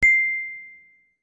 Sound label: Ping, notifications; Rollable LM R910N; LG